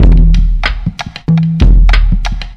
Loop

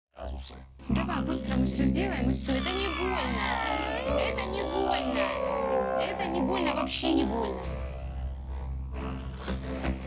For Drums

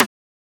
Transient; FLPRO

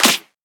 Shifted Clap, Claps; FLPRO

